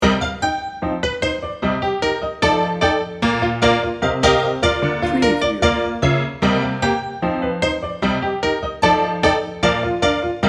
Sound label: silent movie rag loop